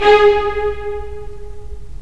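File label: STR, strings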